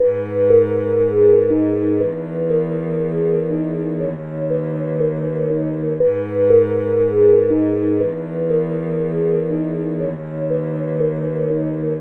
orchestral background music tone ringtone

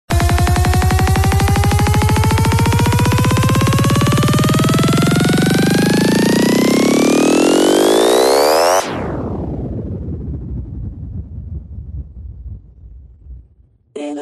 trap ringtone; aladin

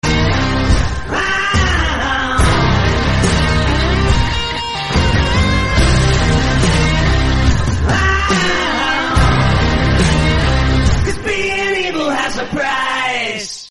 na na na hip hop rocking music tone ringtone

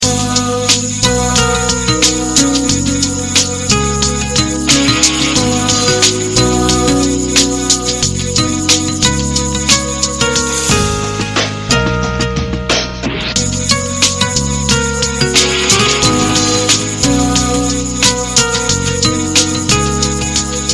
nokia asha ringtone

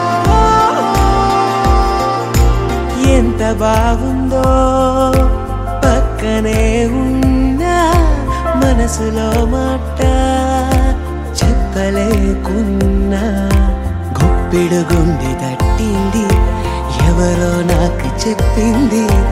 telugu ringtone

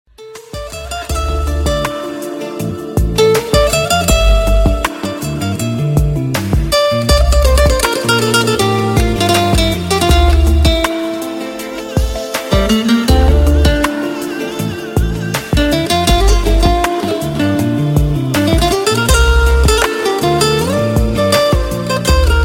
spanish guitar ringtone

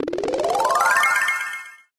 splash refill

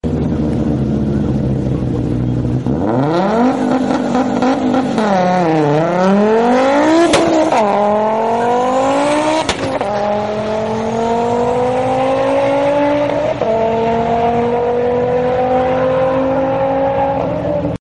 racing car sound funny tone ringtone

